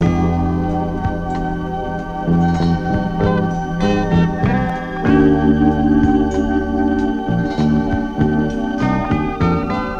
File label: strings; Phonky